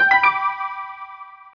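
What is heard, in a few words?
remote connect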